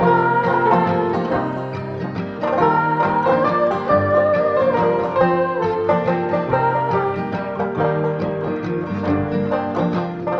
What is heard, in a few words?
guitar voice, Sad; Loops